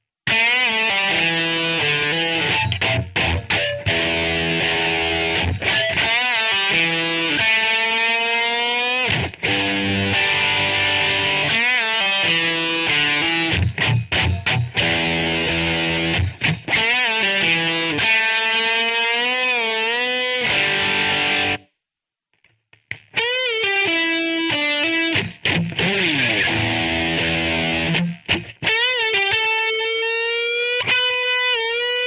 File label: Rock guitar; Total Rock gt